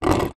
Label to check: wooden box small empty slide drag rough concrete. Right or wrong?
Right